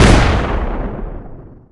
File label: balls destroyed; 祖玛